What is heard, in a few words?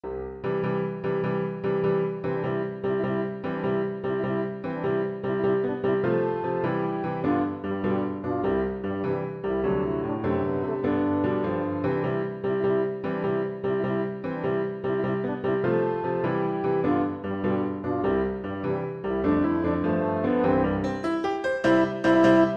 french horn